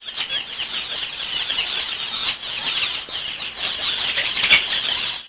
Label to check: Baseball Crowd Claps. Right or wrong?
Wrong